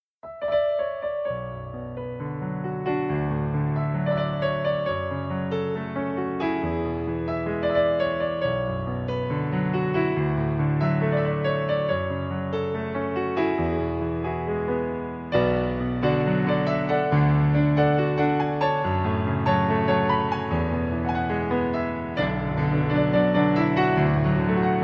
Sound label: Piano Cover